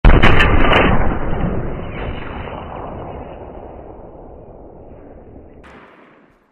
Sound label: semi auto sniper rifle headshot fail; fortnite epic